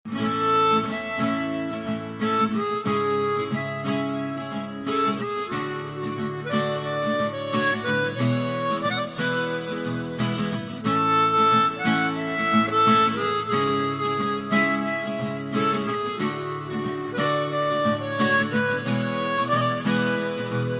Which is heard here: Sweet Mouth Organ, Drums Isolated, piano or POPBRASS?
Sweet Mouth Organ